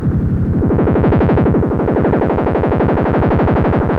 monodelay Fm 109, Loudest frequency 395 Hz Recorded with monotron delay and monotron - analogue ribbo; instruments; loops